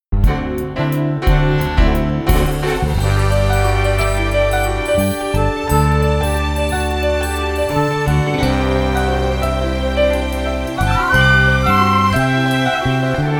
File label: Accompaniment; camp show in a week audition materials